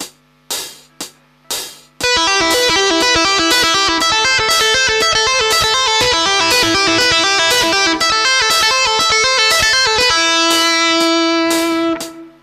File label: tap